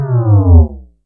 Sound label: JNG BASS, Yamaha DTXpress